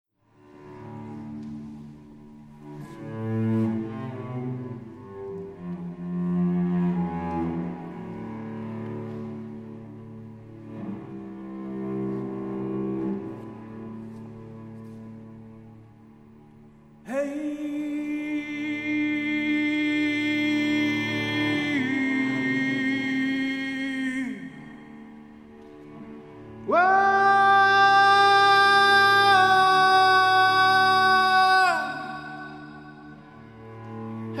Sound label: Improvisation